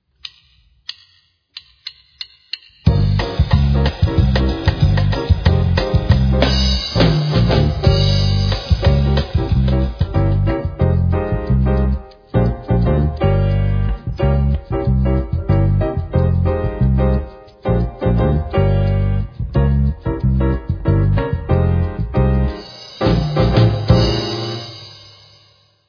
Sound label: Drumset; First Steps In Drumset